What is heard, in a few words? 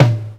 low mid tom sample a sharp key, tuned to the A# note. Loudest frequency: 466Hz; tom drum